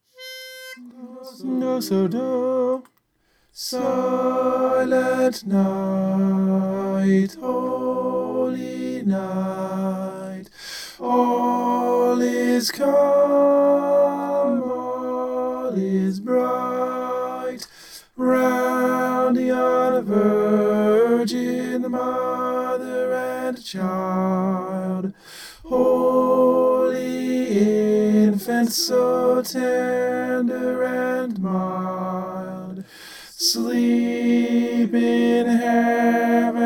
tenor

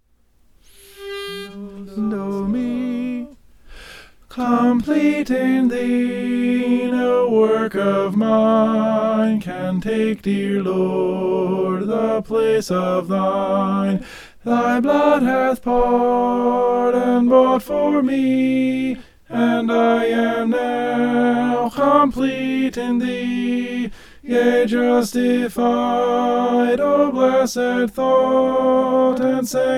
complete in thee tenor

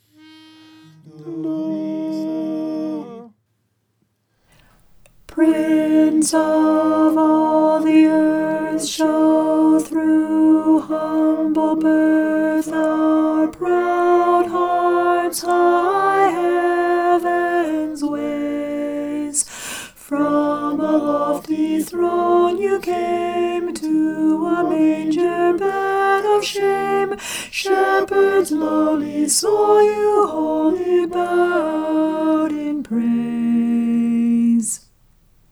prince of all the earth alto

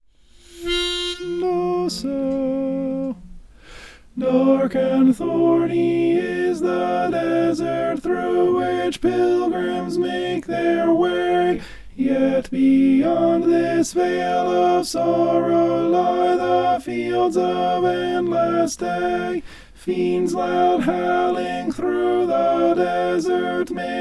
alto

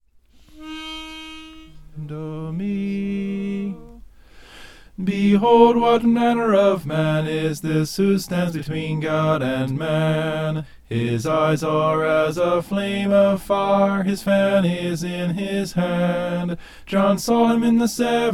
soprano; behold what manner

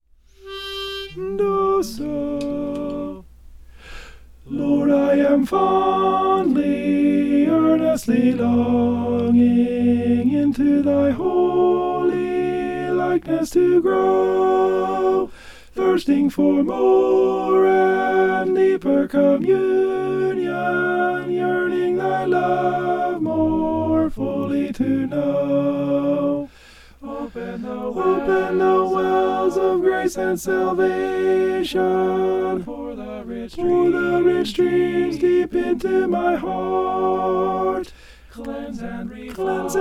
alto

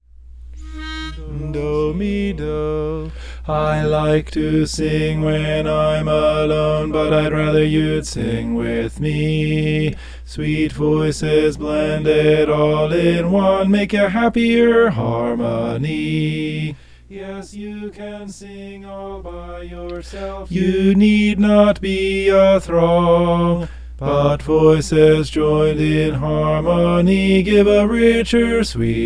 i like to sing bass

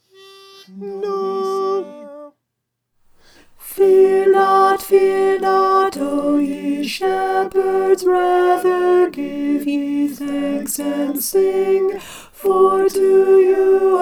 alto

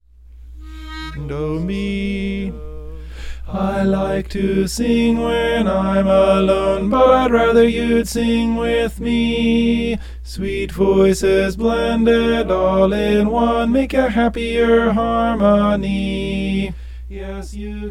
i like to sing tenor

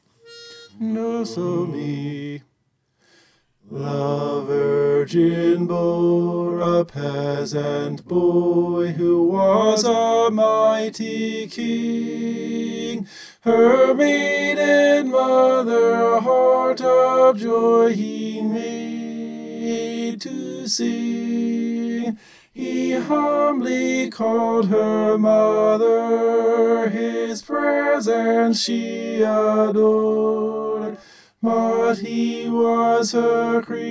tenor

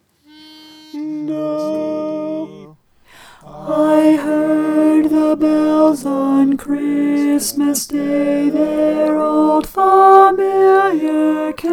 i heard the bells alto

